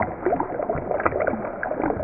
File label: Slow Water Loop; Drones Abstract